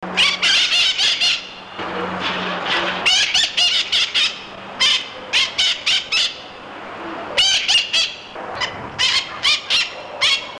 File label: chapmans parakeet